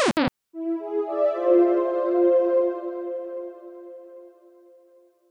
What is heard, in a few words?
lose music